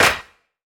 hand clap tuned to the A# note. Loudest frequency: 2581Hz; single hits one shots; verby